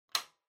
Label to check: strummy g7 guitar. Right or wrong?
Wrong